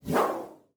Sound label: Text Message Woosh; SoundsCrate